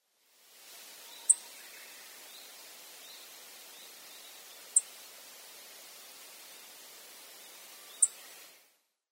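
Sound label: chestnut bunting, sakata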